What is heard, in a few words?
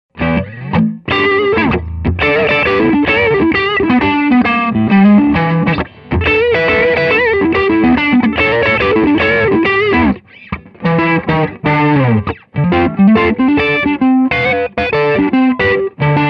Hollow T neck, pickups